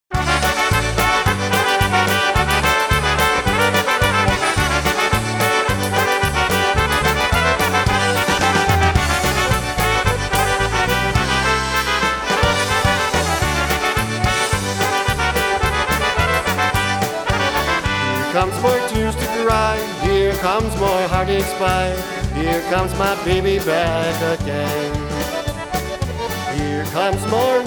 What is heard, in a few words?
Polka